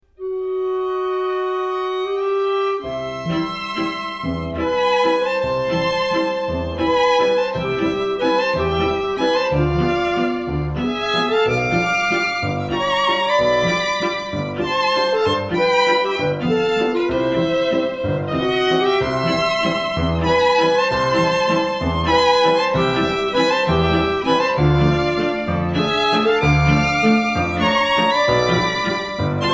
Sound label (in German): walzer